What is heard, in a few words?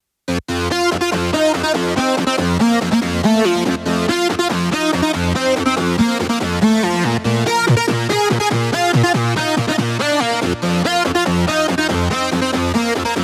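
super saw lead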